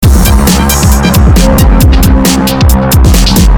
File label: Loop